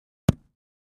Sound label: Ground Hit Sledge Ham; rocks & dirt